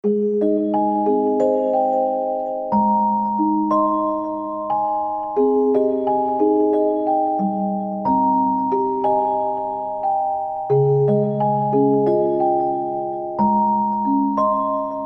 Heartbeat (Music Box Vers.); Impostor Factory Original Soundtrack 2021

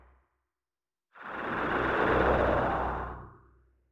enemy death; deathworld

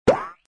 press pour button; papa s freezeria gamerip

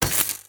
fuse

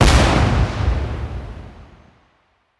Fireworks Large Explode; angry birds rio

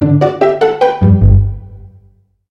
(Jingle) Draw; Wii Chess

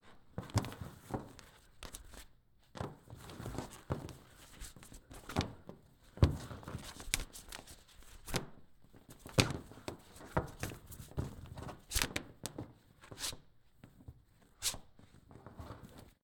Tie Rope

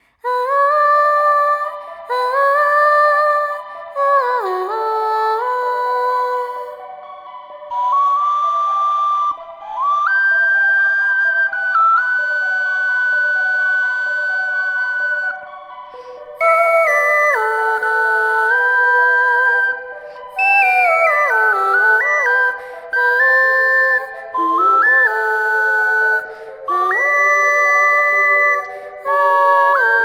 Ocarina

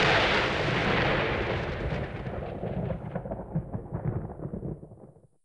weather thunder

